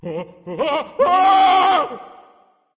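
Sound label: non verbal fear, voice; cernunnos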